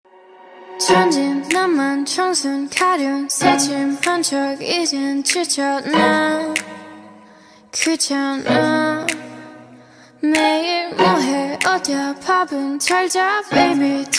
solo

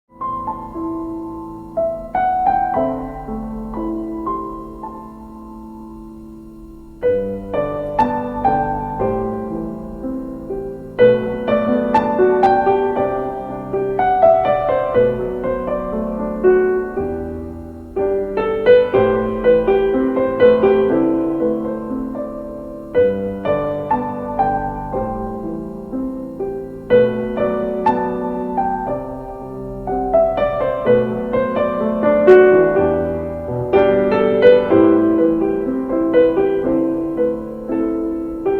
Piano

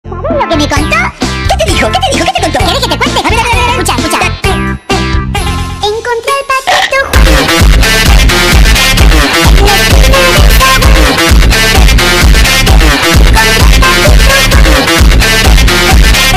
Phonk

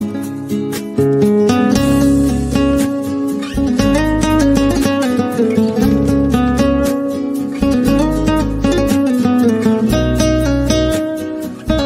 ringtones

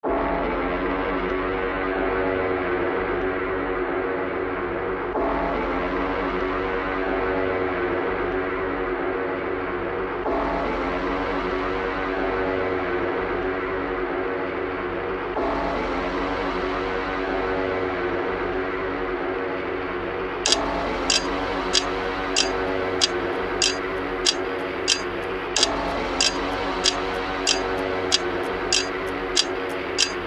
instrumental

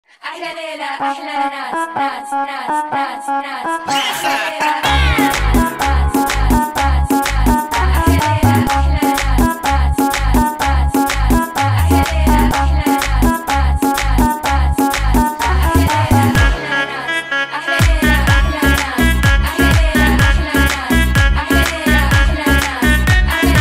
Beats

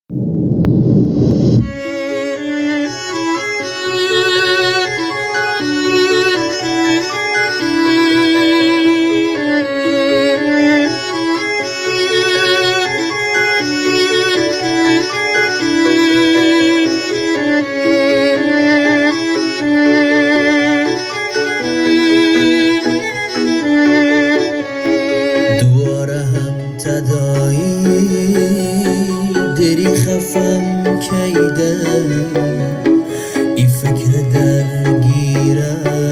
Tada

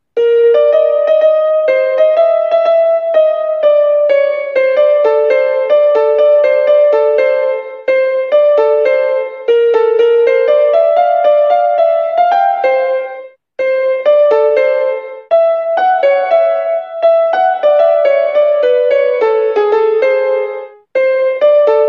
Piano; Tom Jeri

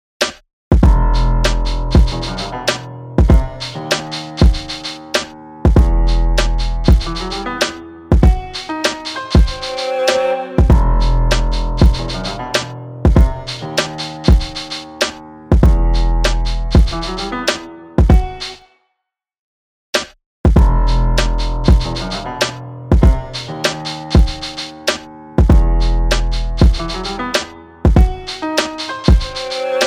Sath instrumental

